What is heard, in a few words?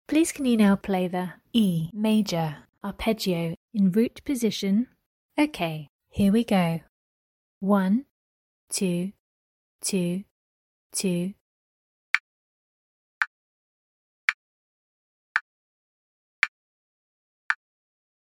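E major arpeggio in root position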